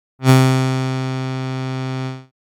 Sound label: Violin A, synthlead